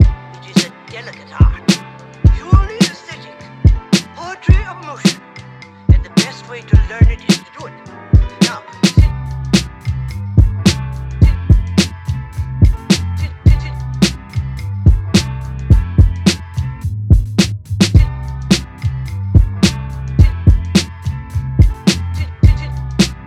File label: Lofi